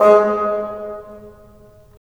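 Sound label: wind bassoon; LA Composer vol. 3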